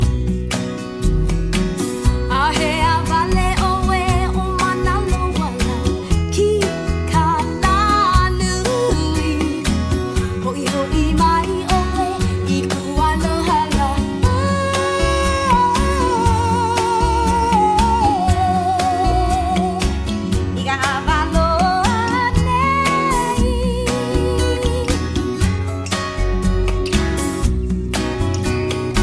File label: Hawaiian